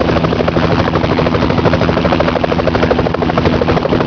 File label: Heli loop; Chase Hollywood Stunt Driver; XBOX